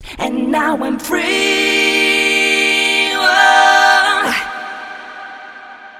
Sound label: Choir; Neptune